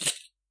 Chainmail Rustle Sound

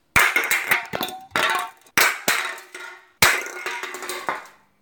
Throw Can Of Cola

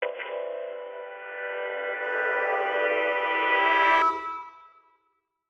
Reverse Vocoder

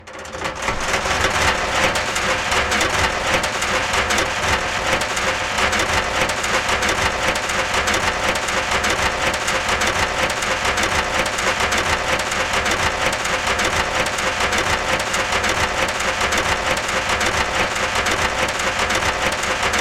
Anchor Chain

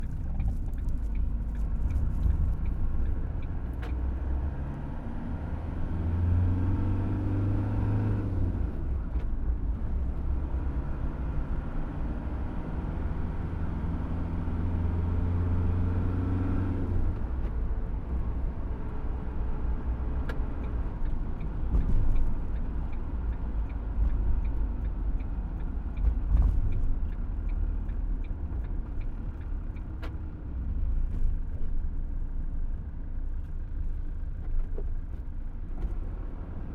Ford Transit Gearing Up

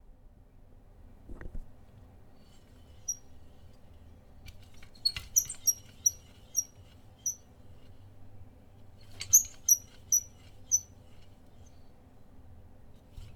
Metal Squeak

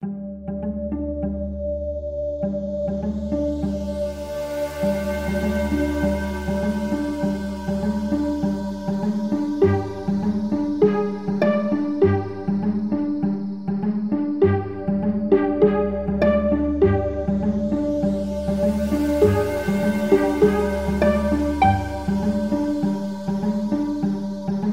Cinematic Intro

